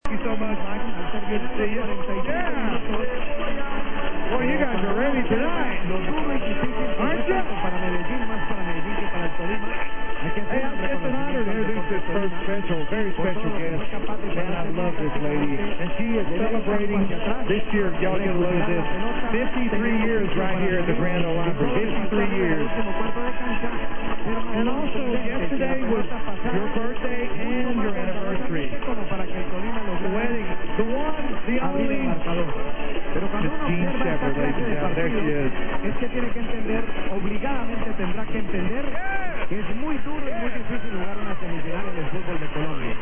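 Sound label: Bogota Colombia, radio; HJKH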